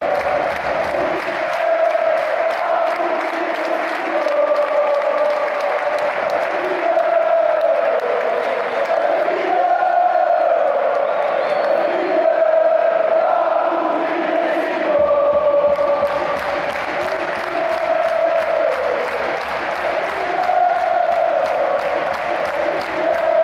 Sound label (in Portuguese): benfica da me o, fan chants